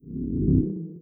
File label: hover; yamaha 2022